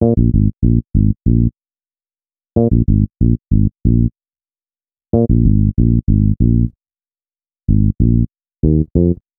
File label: Bass, Hip-Hop